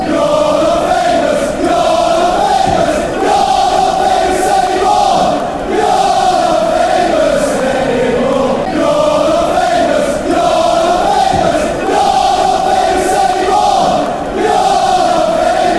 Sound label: youre fanchants; bfc